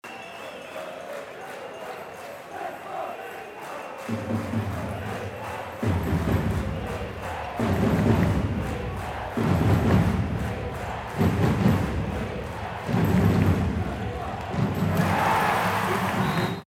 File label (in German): Handball Hallen Spiel Atmosphaere